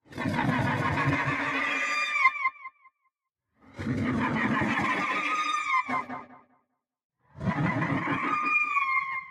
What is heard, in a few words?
Alien Screech